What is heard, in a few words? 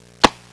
Button Sound; Interactive Preview: Armageddon